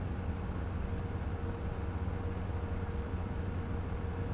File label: Ambient Oxygen; Among Us